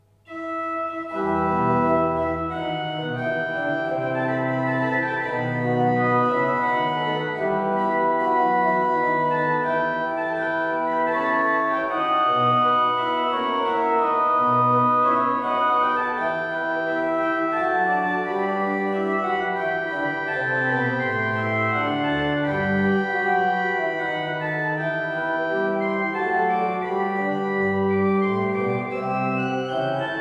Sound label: Buxtehude Courante, Orgel; Altkuenkendorf; joachim wagner orgel